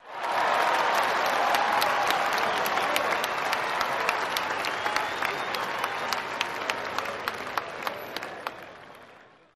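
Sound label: Applause Cheering Large Crowd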